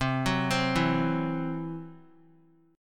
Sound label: C-Major Flat 5th; arpeggiated